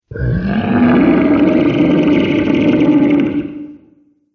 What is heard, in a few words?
Dinosaur Creature; animal; Dinosaur Sound Effects